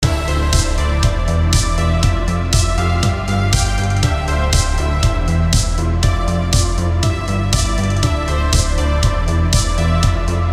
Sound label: synthwave